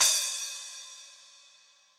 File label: Splash 12 Old School, Cymbal; Drum Hits; Standard Hits; Ableton; Factory Packs; Session Drums Studio